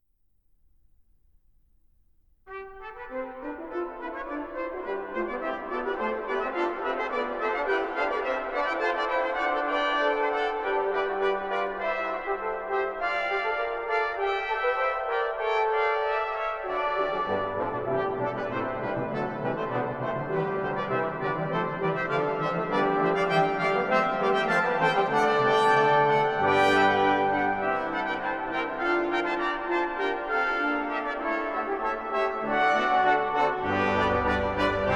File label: Music for Brass I Canzon XV a 10; Gabrieli